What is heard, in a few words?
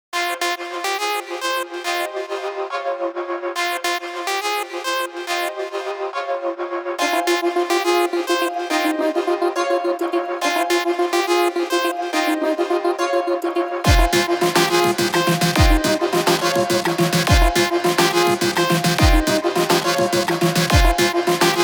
Madness Trance